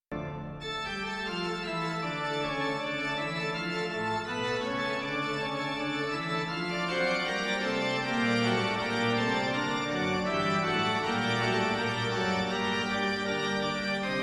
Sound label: in D minor; toccata and fugue